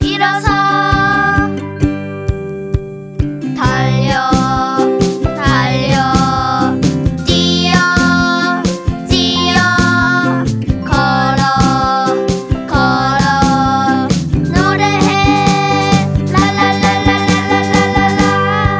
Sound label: song korean